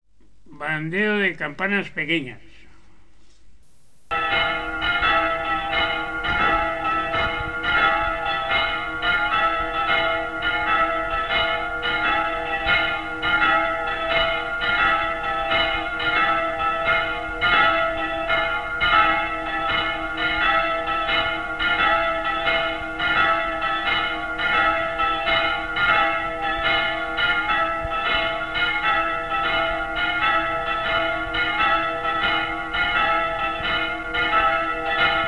Toque de Queda, Campanas

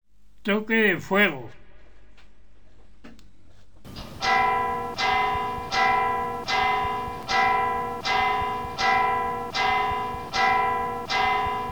Toque A Rebato y o a fuego, Campanas

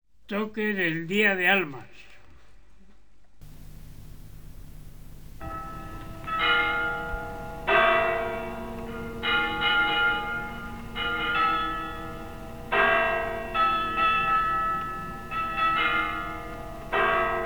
Toque de Animas, Campanas

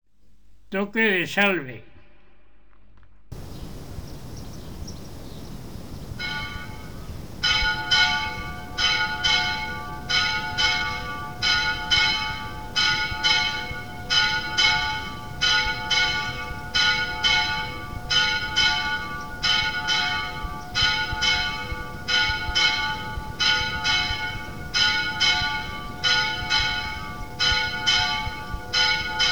Toque de Salve, Campanas

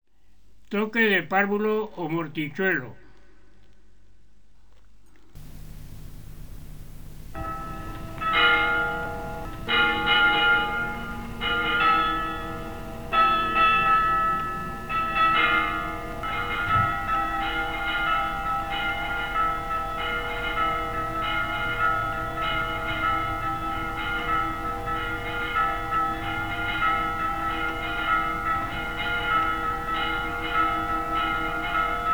Toque de Parvulo, Campanas

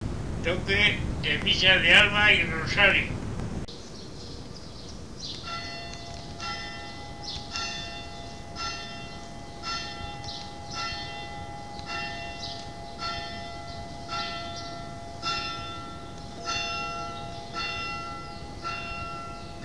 Toque de Misa del Alba y o del Rosario, Campanas

